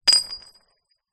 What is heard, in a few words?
metal bar small place down roll away; foley